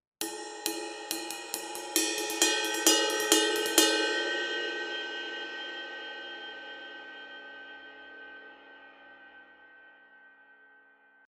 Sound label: ride; sabian 22 signature neil peart paragon ride cymbal